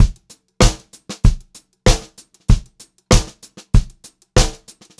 POP VARI, Pop-Rock Drumloops

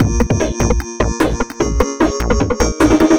150 Krisp N Dry Full, 150bpm; Combi Loops; Nu Dance Electro

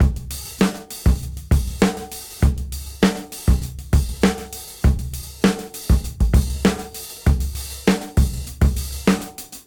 KL Beat 98 BPM; AMG - Keith LeBlanc Phat Butt Jams

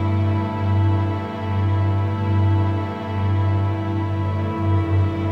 Pad, Orchestral; Sonic Foundry (Sony Creative Software); Orchestral VOL-2; Modern 25

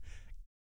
BASS BOMB; Spectrasonics Vocal Planet

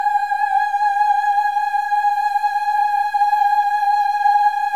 VIB M G#5 -L, Symphony of Voices; Spectrasonics

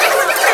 PEOPLE, FAIRLIGHT CMI IIX

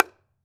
H-BONGO MH; AKAI S-Series CD-ROM Sound Library VOL-5

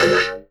SPLASH, Anime Sound FX; COMIC

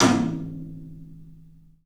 TOM, 3D Drums, CLUB TOM; 3D Sound; CLUB 12 TOM

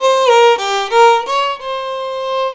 VIOLON B, Indian Traditions

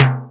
Korg N5 Rock Kit C#3

